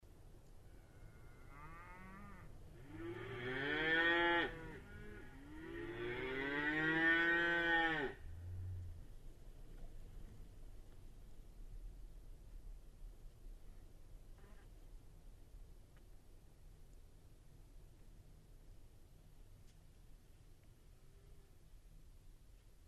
Cattle; Colorado

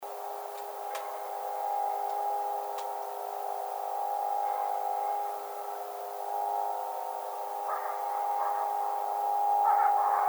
howl, Coyote; Coyotes (Canis latrans)

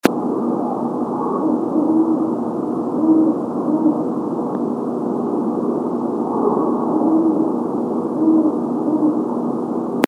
Great Horned Owl

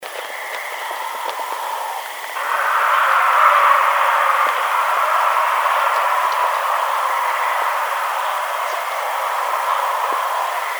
Hissing, bigfoot

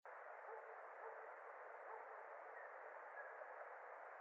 Illinois Howl filtered long version

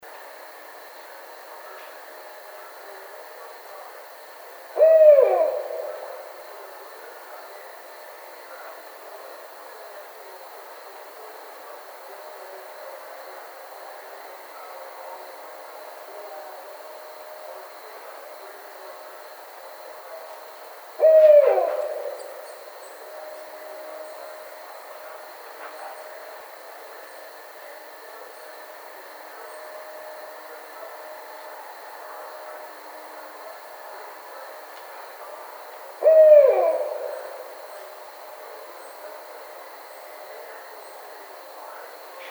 Barred Owl

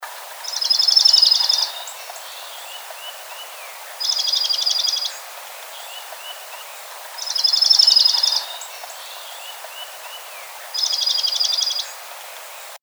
Chipping Sparrow

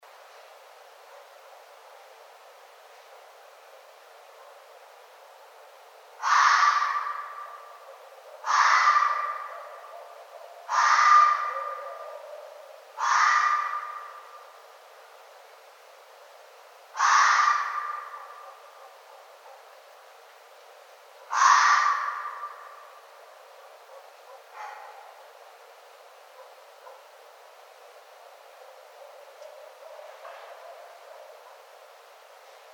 Red Fox

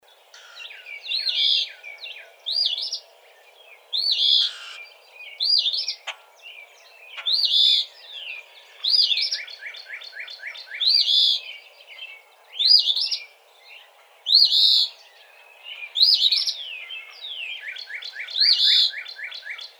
Eastern Phoebe

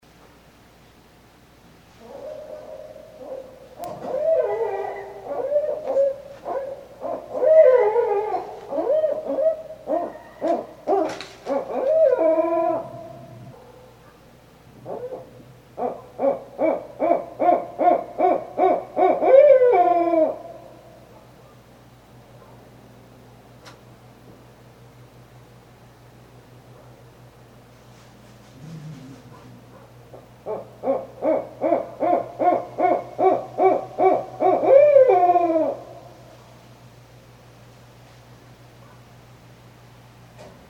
Barred Owl

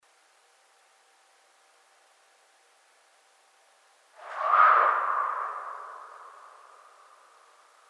Snort; bigfoot

